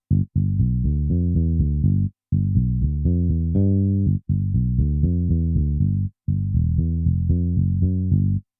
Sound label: Bass fingered, XV-88